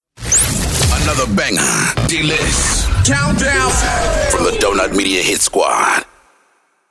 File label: Drops